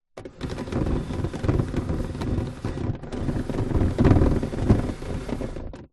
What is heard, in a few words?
household garden table plastic slide creak